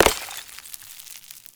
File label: Destroy Planter Hanging; Ambience; Gameplay; Warframe; Nineteen Ninety Nine